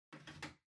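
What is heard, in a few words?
Ceiling Light (Far remote control Holder Put In)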